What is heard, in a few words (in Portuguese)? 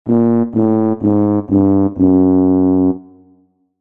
som de derrota wha-wha-wha efeito sonoro